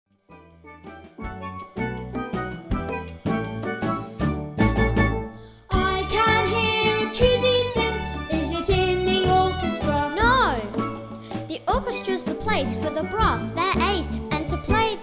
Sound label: Orchestra Samba